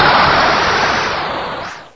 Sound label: training wind stops